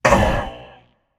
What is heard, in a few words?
surprised, voicepack; smite; jorm